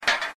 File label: gate locked; Granny 3; Android